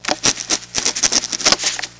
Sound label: Email Notify